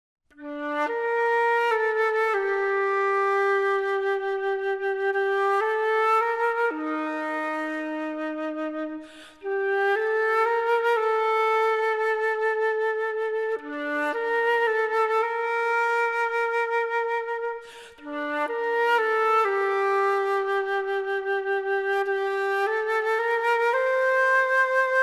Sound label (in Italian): Flauto e Pianoforte; La grande guerra